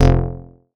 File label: bass F1, PPG Wave 2.2